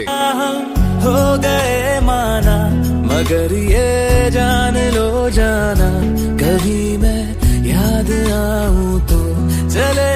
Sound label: bollywood ringtone